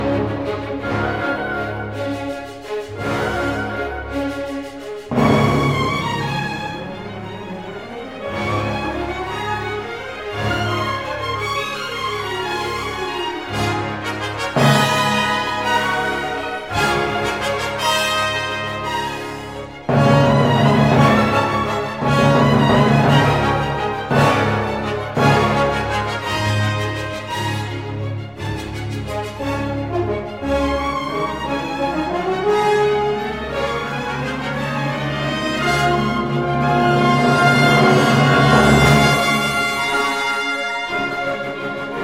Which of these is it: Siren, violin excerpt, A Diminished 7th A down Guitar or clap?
violin excerpt